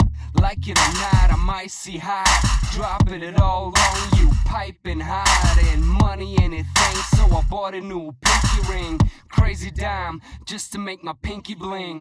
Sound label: Heavy Weight, free loops